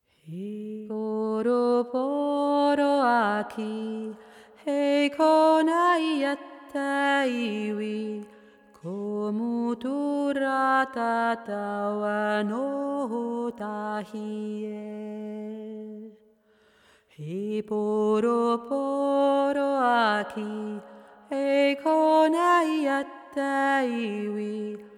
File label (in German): maori tiefe Stimme